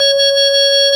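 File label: Organ Vox C#5, Continental, Vox Wah Bar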